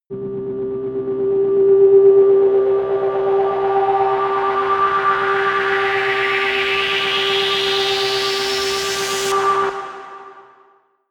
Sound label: rise hum whir medium